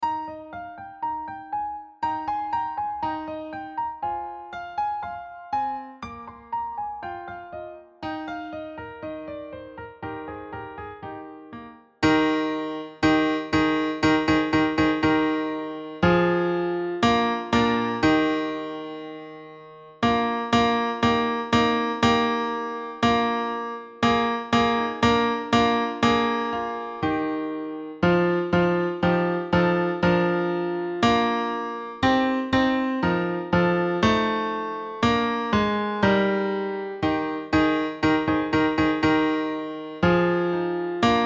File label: Ring Christmas Bells Tenor; Albert McNeil funeral